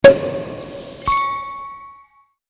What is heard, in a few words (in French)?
bruit de bouchon champagne; marque sonore